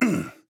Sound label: grunt attack; paladins; Engineer